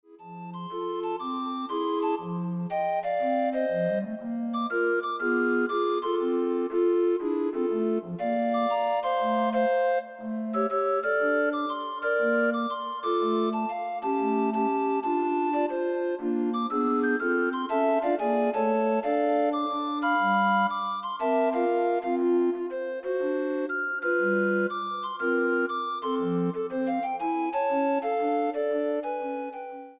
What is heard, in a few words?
Recorder Ensemble